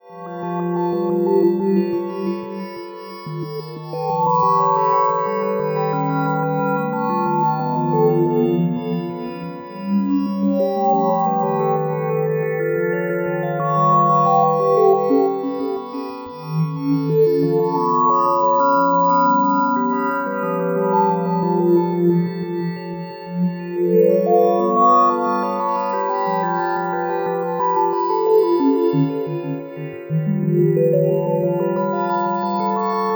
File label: Fly Over, Harmonic Atmoshperic